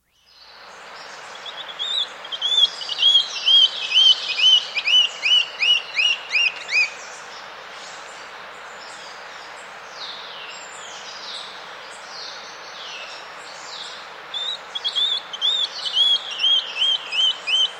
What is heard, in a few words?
Brown-Billed Scythebill; S. America